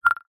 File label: multimedia notification alert short slide